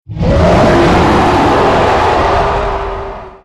roar; Legends Of Coyote Gulch

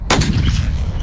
Shoot

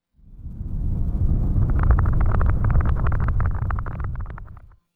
thunder; orbit

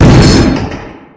cannon; alien-rush

